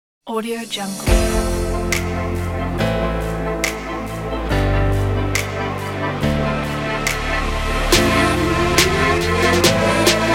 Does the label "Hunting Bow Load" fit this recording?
No